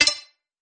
Clicky; gridtracker

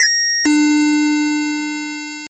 Charging blip sfxr

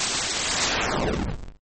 Tearing chiptone

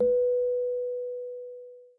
elec piano; turtlestitch

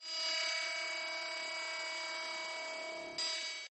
Quiet ringing and attack

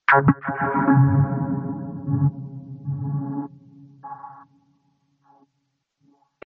Sinus glitches

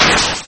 Explosion chiptone

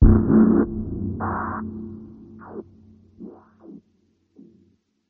Sinus glitches

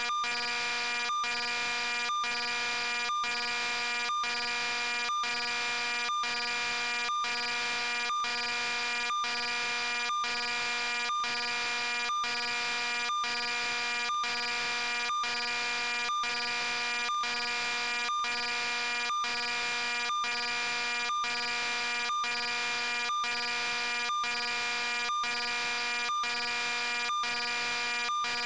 403MHz; RS-tracker; imet

